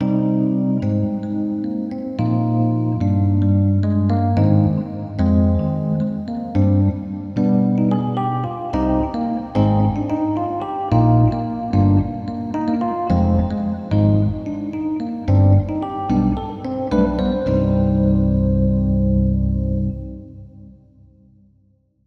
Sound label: vintage key